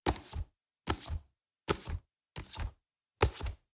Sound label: frisbee throw